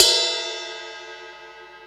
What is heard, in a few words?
ambient ride cymbal sound sample d sharp key, Royality free ride cymbal tuned to the D# n